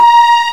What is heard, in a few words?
FAIRLIGHT CMI IIX, STRINGS